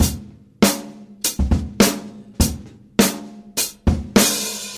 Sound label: 101 bpm breakbeat d sharp key, Free drum loop sample - kick tuned to the D# note. Loudest frequency: 3994Hz